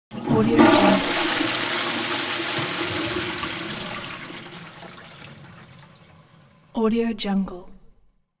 toilet flush

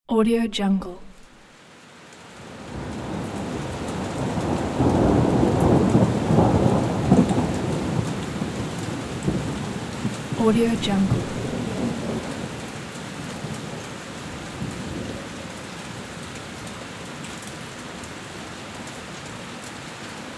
rainstorm

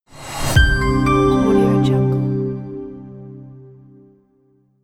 bright soft atmospheric logo reveal

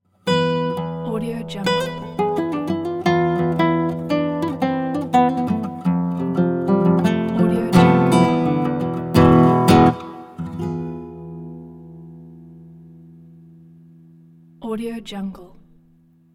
beautiful acoustic spanish guitar logo

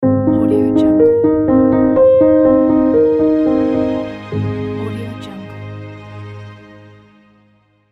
piano and strings logo; musicalsmile

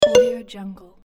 marimba video game pause sound

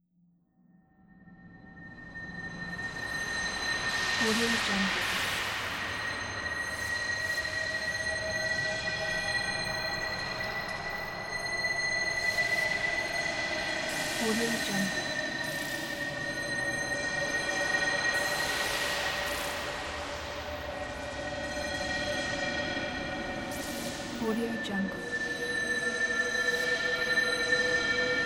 abandoned station ambience

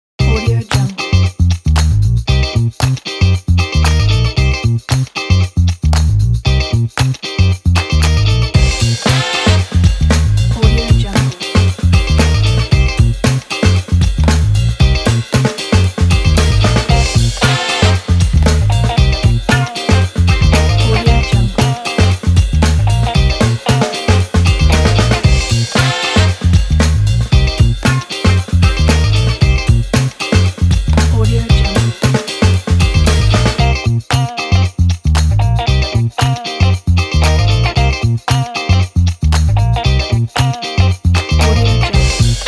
the funk; sonar-audio

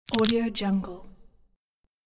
bone break snap; prankaudio

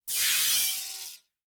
evil screams high pitch squeal feedback; prosoundfx

